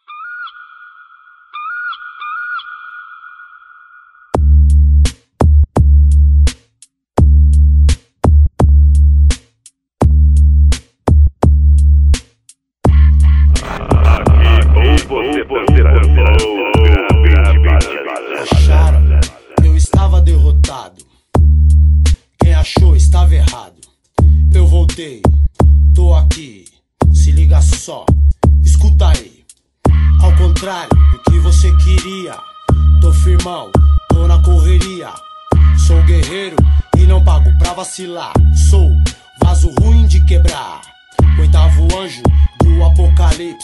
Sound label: sound car